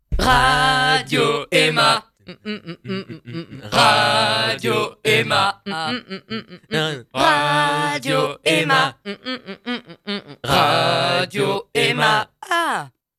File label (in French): jingle radio chante